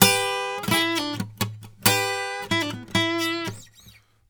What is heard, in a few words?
Blues Guitar; ILIO; Fretworks; LICK